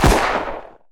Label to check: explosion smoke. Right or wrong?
Right